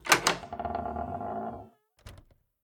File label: Door squeak creak; Devour